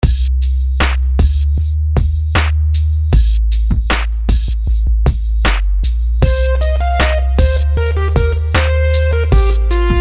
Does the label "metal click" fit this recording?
No